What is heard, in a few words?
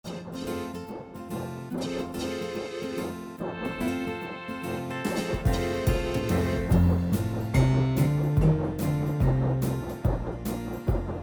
Boogie; clavinova festival; Halloween